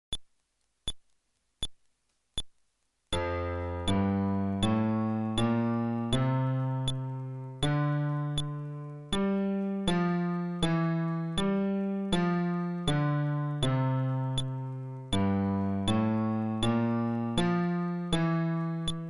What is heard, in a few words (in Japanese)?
フレットレス (テンポ80)